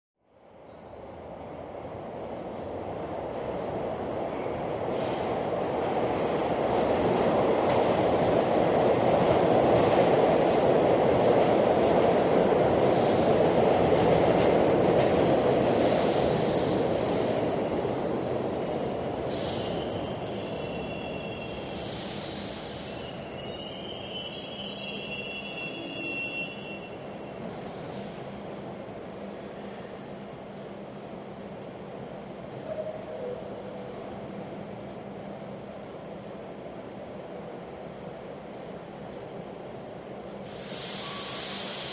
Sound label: Subway Train In Stop, subway